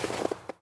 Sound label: snow, footsteps; Garry's Mod; tf2